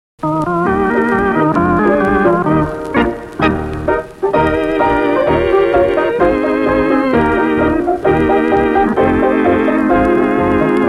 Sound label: quadrille, instrumental; little old log cabin in the lane; 5 part singing quadrille part 3